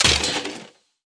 dice Roll; fairyred

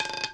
center Roll Long, roulette; americanroulette3

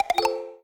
Attention Free Spin Double; flowers

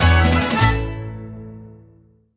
medium Win; colossal pinatas

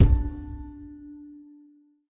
reel Stop; fairyred

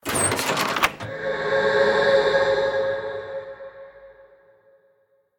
free Spin Transition; mythic maiden

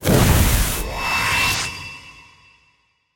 transform Trench; finn

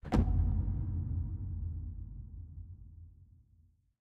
reel Bounce; rise of maya

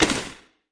spin button click; fairyred

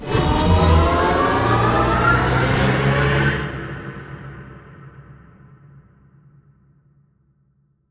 near Win Wild Spin; wild bazaar

